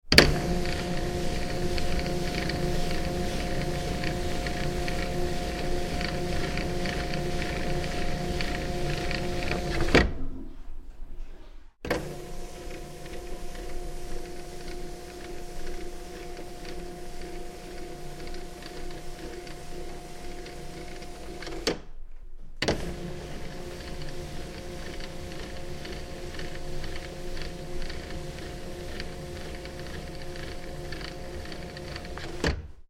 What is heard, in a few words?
Electronic Display Board, Motorized display board; Motorized display board various movements; Miscellaneous Office